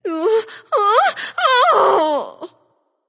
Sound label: fear; smite; arachne